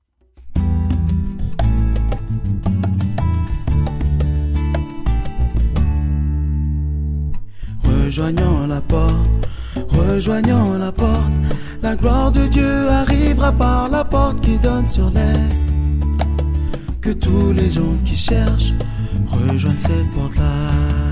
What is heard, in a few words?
Song; FR